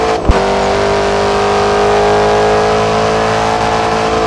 fourth cruise, vehicles; hellcat

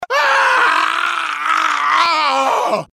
scream, male; gmod; npc

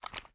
reload start end, weapons; gmod; viper

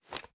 reload fast xmag rotate; gmod

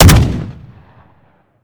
weap fire; gmod; weapons

